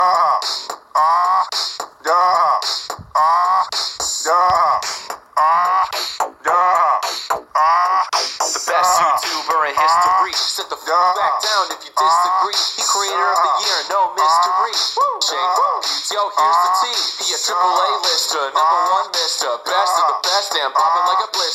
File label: Grunt; Will Smith